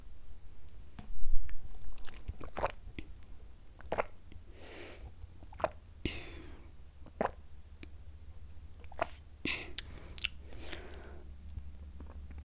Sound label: Water Drinking